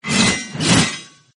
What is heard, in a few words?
attack; Power Rangers vs Teenage Mutant Ninja Turtles - Ultimate Hero Clash 2; galvanax